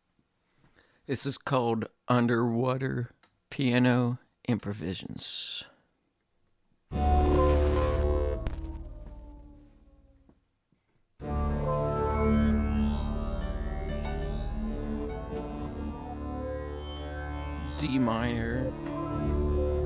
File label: Underwater Piano Improvisation soundcheck; hyper terrestrial; Incomprehensible